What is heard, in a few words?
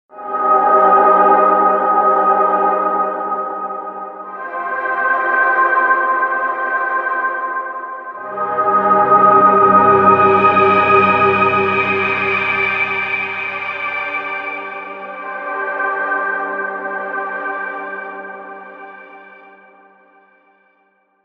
Moshy poly pad